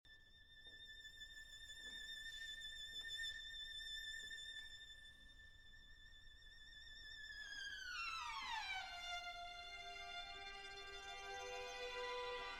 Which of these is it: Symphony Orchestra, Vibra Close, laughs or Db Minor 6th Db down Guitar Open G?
Symphony Orchestra